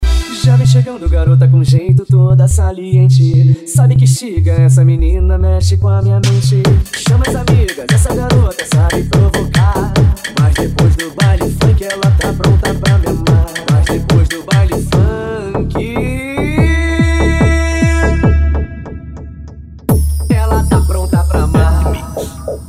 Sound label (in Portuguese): mega electro funk